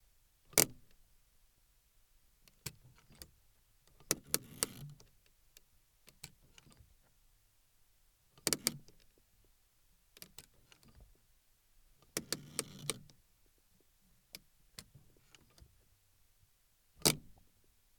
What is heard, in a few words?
Handbrake Slow And Fast